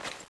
crossbow zoom in, weapons; crossbowex21; cstrike

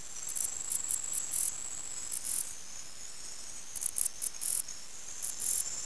jap cicada; cstrike; desperados

